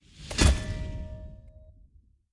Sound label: sting rank ui appear; Armello The Bandit Clan